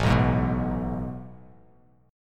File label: A Major 7th Suspended 2nd, Bass Standard; AM7sus2 strummed; JGuitar